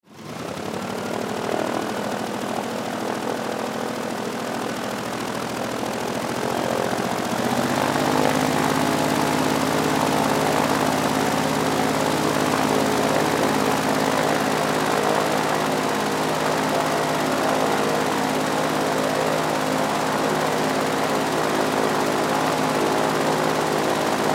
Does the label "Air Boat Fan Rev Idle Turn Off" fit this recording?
Yes